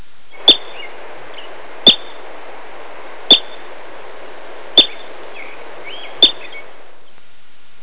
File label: marmot